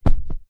household phone cell samsung galaxy s9 in case throw onto bed mattress